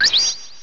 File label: Multi Attack; pokerogue; battle anims